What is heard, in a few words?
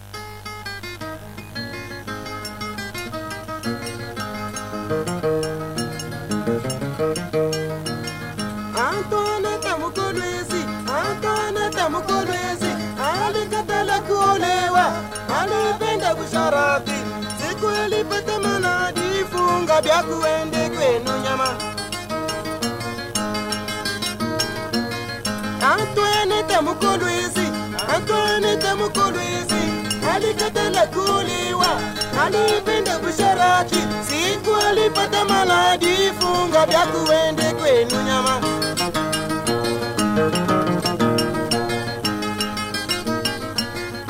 congo guitars